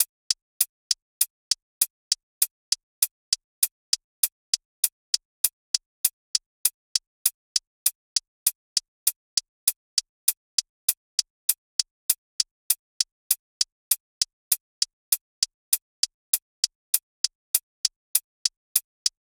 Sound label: hats 100, Hat Loops; drum sample pack; SEISMIC; Slate